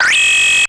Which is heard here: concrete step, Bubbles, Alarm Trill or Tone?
Alarm Trill